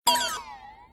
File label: alert